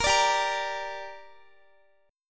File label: G Minor G down Guitar, chord; Gm strummed